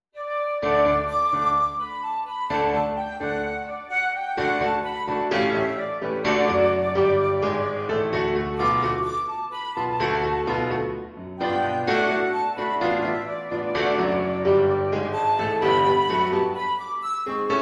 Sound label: rock Flute and Piano